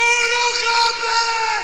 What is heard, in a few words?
yell; mortal kombat